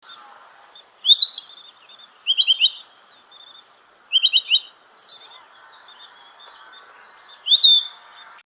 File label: piratic flycatcher, Belize birds birdsongs